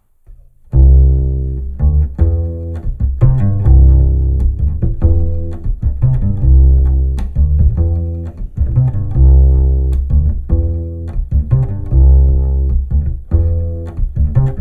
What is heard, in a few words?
improvisation with a bird